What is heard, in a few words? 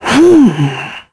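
Vox Sigh, Korean; kingsraid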